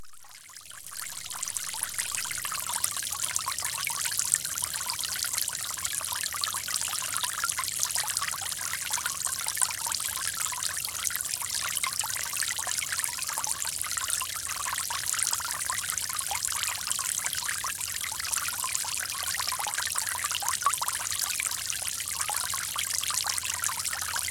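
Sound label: merry babble of a brook; zvuki prirodi; rucheika veselii govor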